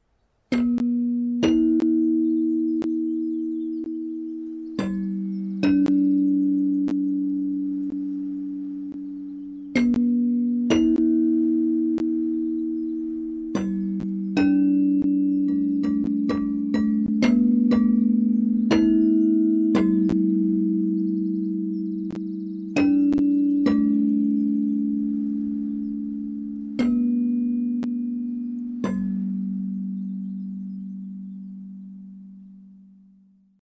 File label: echo piano